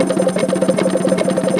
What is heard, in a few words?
Roulette Dice